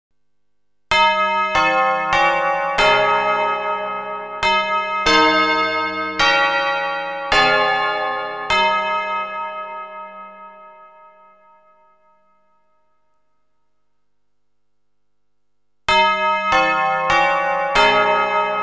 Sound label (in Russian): Гимн Союзного государства Колокола Спасской башни Кремля